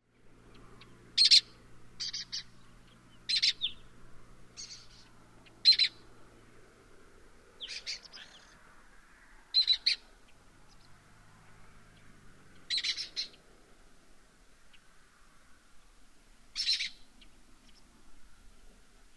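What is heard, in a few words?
Lesser kestrel, bird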